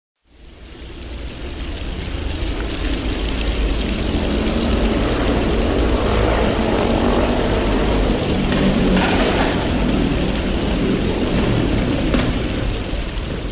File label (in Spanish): plazas fuente de agua; sarmiento